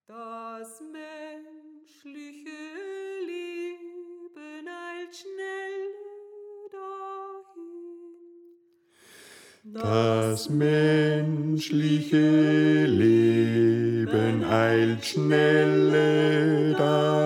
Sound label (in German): Bass; Das Menschliche Leben Eilt Schnelle